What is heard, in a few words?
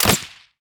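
attack arrow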